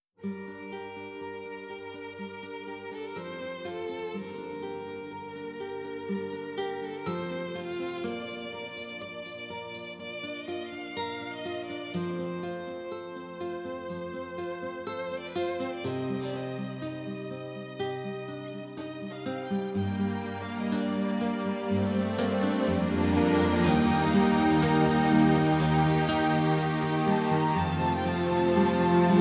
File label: Pianist; Netherlands Pianist